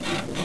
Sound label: SQUEAK; Matrox M3d